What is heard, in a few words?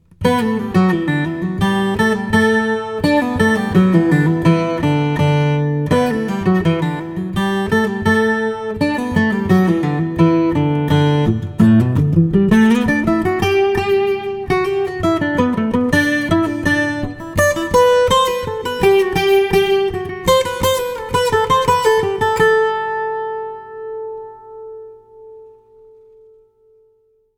Country Folk Lead